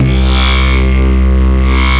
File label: Synthesizer Middle C Notes, Lead